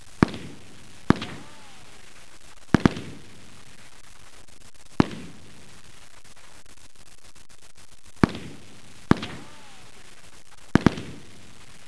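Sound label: M60 machine gun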